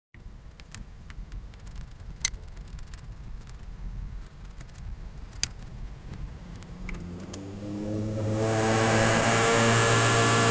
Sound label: the sound of a motorbike; motorbikes